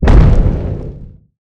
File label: dispose impact; weapons; dota; marci